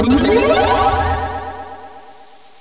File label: woohoo; ghost